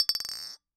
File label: Bottle Struck